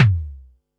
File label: Bassdrum, Pearl SC-40